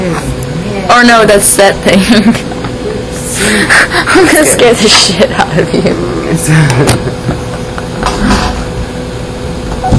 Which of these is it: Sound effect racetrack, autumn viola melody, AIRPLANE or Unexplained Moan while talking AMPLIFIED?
Unexplained Moan while talking AMPLIFIED